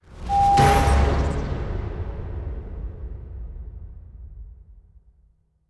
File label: kill stinger